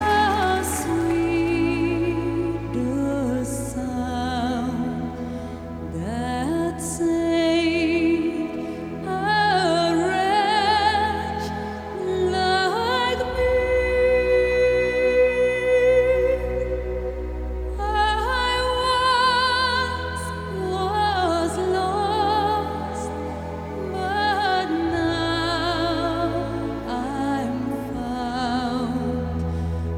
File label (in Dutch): live met applaus